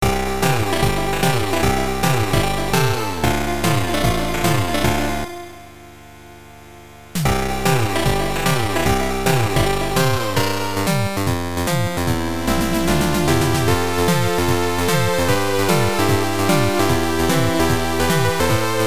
you lose; Jantei Monogatari 2 Uchu Tantei Divan Kanketsu Hen; PC Engine CD

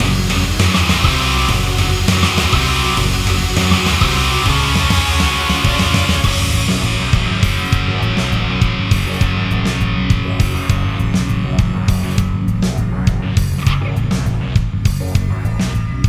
Core Boss Loop; Tinertia

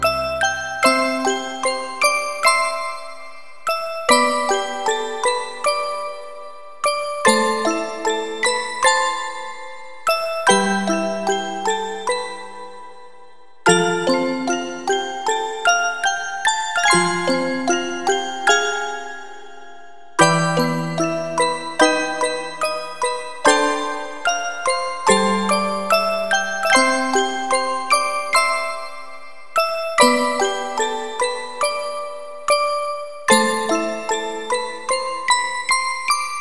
parting day orgel arrange; mahou shoujo ai 2 soundtrack cd

